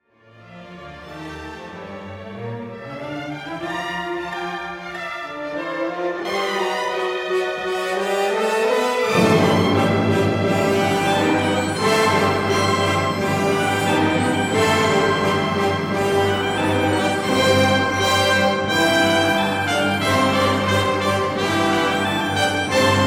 Kulminacja jest masywna i potężna, prowadzi też do wyraźnego przyciemnienia muzyki; Horenstein; IV Symfonia Gustava Mahlera

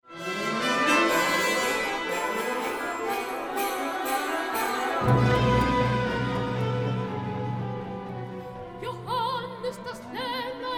waltorni sugestywnie naśladującej porykiwanie wołu; Bernstein; IV Symfonia Gustava Mahlera

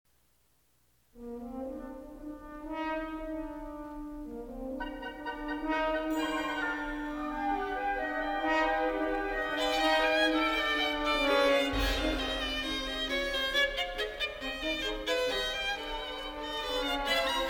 Scherzo jest świetne – bardzo wyraziste, ostre, ze świetnie wyciągniętą solówką skrzypiec, barwne, koślawe, groteskowe i ironiczne; Bernstein; IV Symfonia Gustava Mahlera

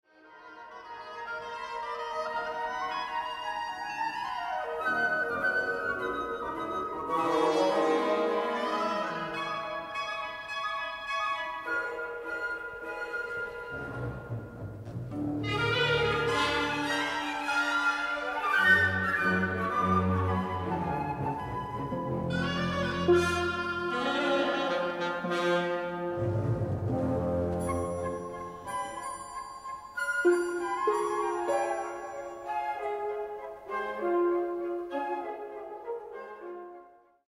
świetna gra drewna, wyjątkowo dobrze słychać tu też harfę; Barbirolli

